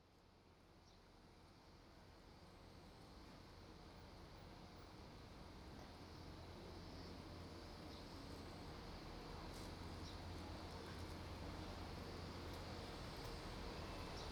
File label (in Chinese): 乘務完畢後吃刨冰(刨冰機、風鈴、享受著用湯匙弄碎刨冰和冰凍的感覺。遠處有蟬叫。; 蓄音 萬岡